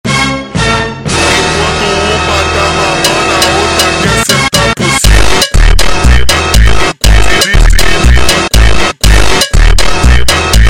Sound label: Funk super Slowed